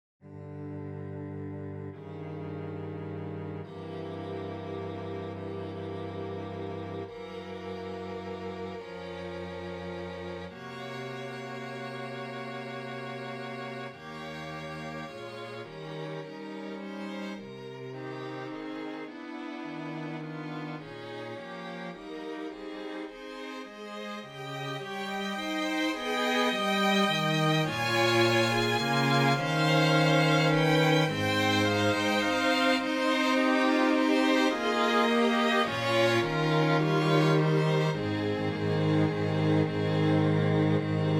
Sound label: String Quartette, first minute only; Freber; 2nd mvt